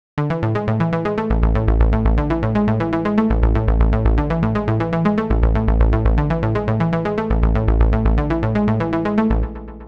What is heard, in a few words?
seq solo saw wave